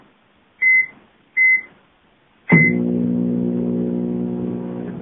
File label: microwave